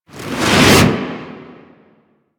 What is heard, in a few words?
Environment Explosion; Proj A; Mission Four; Warframe; The New War